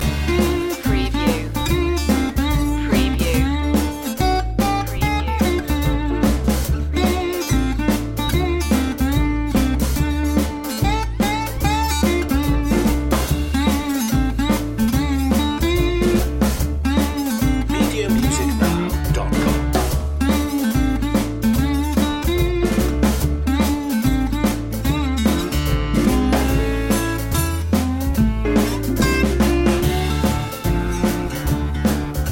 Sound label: apocalypse blues loop